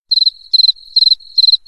grilo silencio

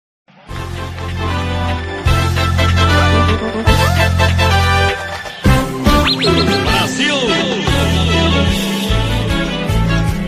vinheta; brasil 2022

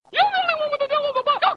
grito bicicleta; liu khan

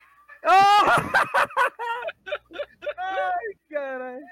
risada gordinho

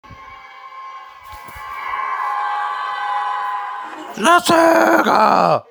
gritos do energia caiu

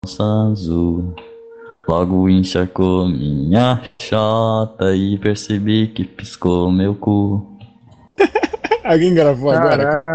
cantando

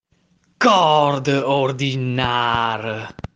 corda

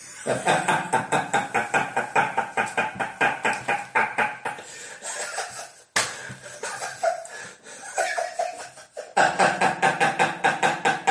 risada

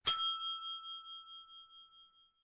campainha

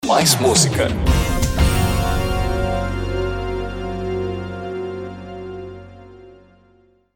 mais musica

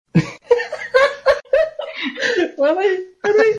risada

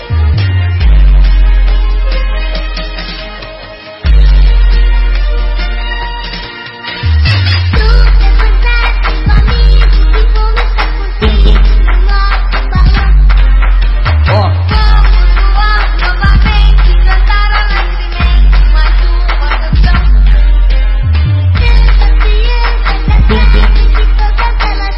funk remix

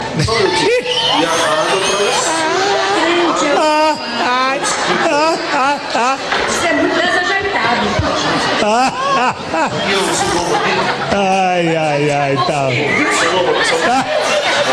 risos

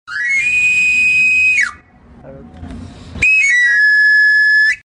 flauta doce

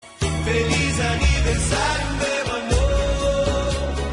feliz aniversario

